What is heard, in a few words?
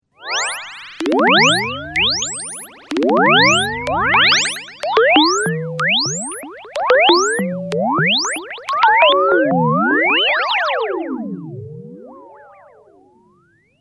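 filter delay; blacet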